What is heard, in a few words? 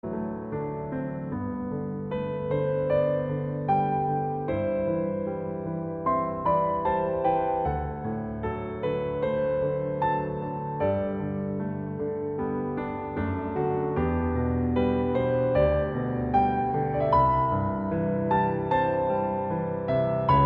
Piano Cover